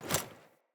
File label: Foley Action Armour Jump Land; Player; Deora Armour